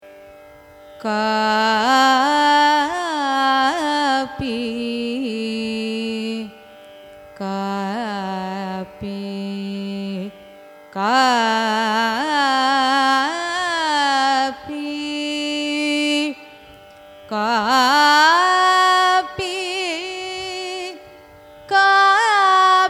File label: raga kapi signature, carnatic music